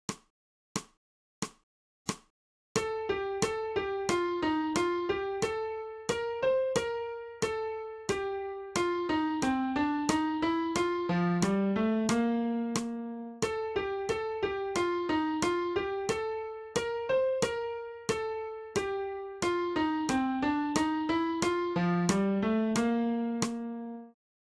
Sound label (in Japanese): 新フレットレス